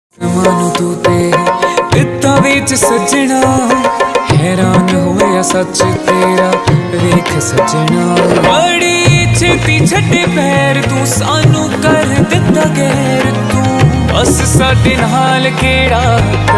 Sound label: Punjabi Beats Ringtone